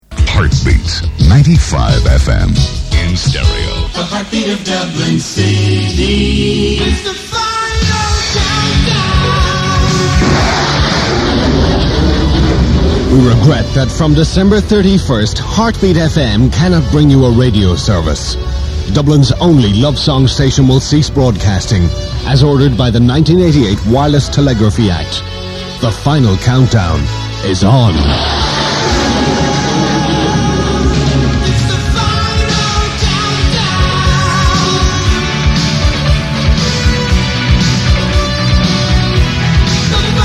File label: heartbeat